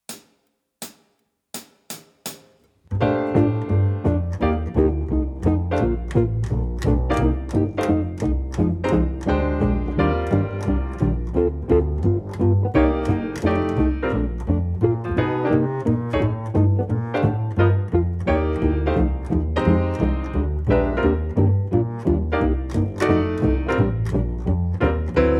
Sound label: Rhythm Changes no drums